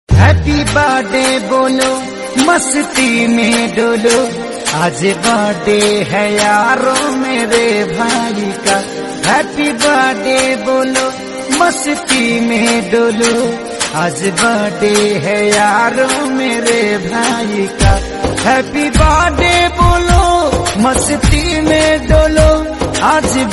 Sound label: Happy Birthday Bhojpuri song